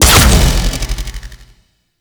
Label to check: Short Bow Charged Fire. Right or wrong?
Right